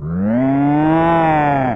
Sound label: roar; N64; YOSHIS ISLAND; big Bad Baby Bowser